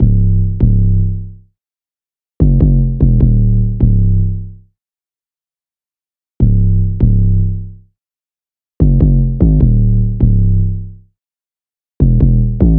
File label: 808 loop 150 Cm; Slate Digital BASSHOLE Sample Pack